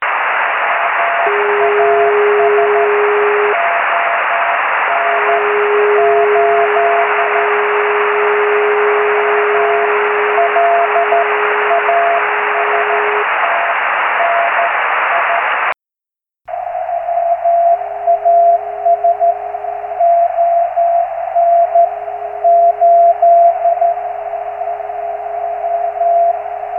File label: CALF noise qrm qsb